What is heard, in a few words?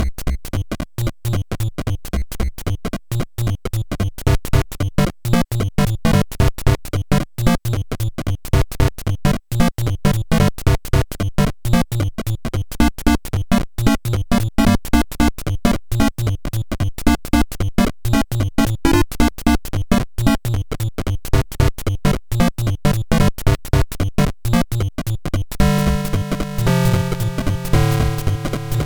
Jungle (Hurry Up!); super mario maker 2; SMB1